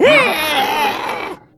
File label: pain; awesomenauts